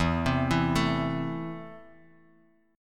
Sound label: E Suspended 4th Sharp 5th, Guitar, Half Step Down